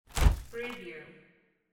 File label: chest freezer door open